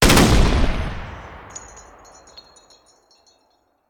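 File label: Artillery Cannon Anti Aircraft; Sound Ideas; Guns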